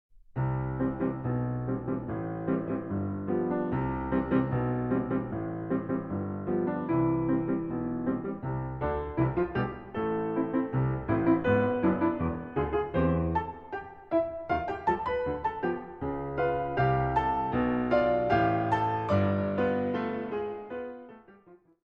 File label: Ragtime